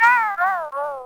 piranha plant death sound

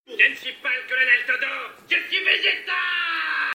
vocal

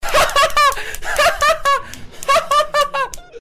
hahah

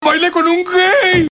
baile

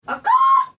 bird sound

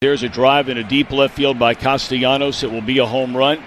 awkwardd

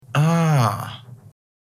ahhb

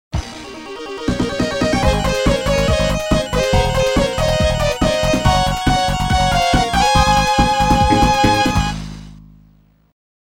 fanfare

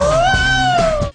uuuuaaauuuu

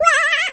burn

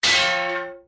hit

